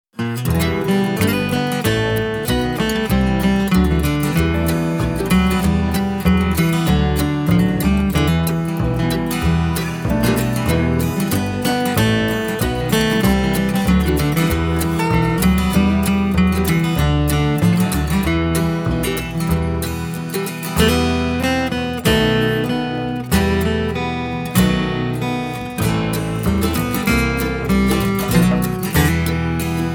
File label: Molom Tune; Swedish Bluegrass music